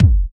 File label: sharp kick single shot g key, Royality free kick single shot tuned to the G note.